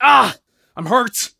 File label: hurt light; Escape from Tarkov